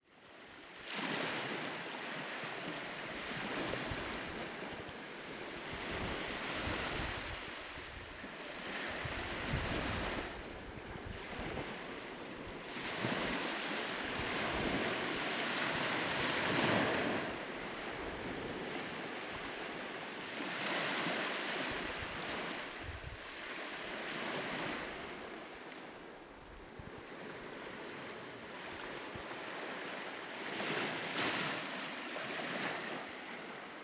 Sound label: Ocean Waves; Smygehuk